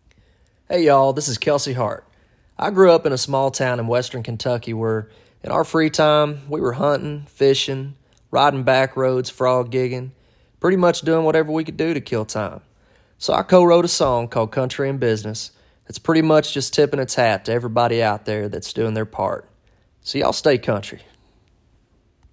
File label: country intro